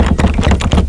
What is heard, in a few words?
shake; NIV Bible, Billy Graham's Steps to Peace with God, WWJD Screensaver